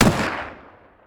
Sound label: marlin ext shot, gunfire